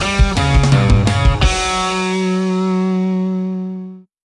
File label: Mission Failed!; The Simpsons Hit & Run; PS2; Fresh Skid Marks